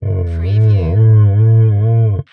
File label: Dinosaur Groan Moan